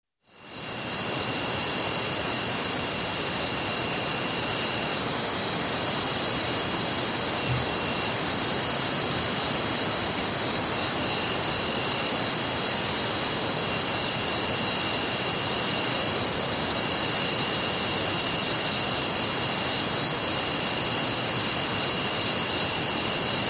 Night Jungle Ambience Distant High pitched Insects &; Nature Ambiences